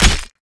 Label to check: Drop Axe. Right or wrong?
Right